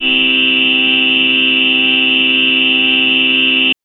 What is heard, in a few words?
Synth VoiceEe maj c3; TrashLibrary